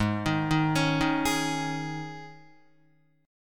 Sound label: Ab Suspended 4th, Guitar; Listen to Absus4 arpeggiated; JGuitar